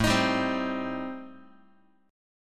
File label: A-Minor 7th Flat 5th, Guitar, chord; strummed; JGuitar